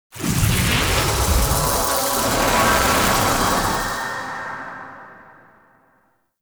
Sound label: Kuva Lich Death Rise; Abilities